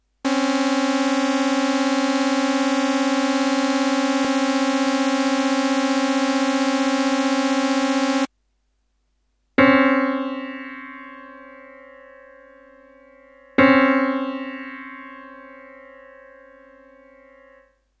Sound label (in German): kleine sekunde; harmonie